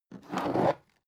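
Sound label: aluminium case small handle drag short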